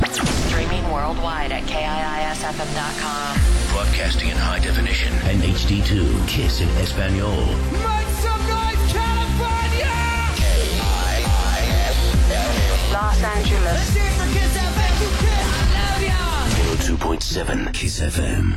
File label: kiis fm jingle